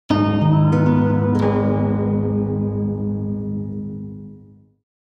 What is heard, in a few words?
Game over lose; gfxsounds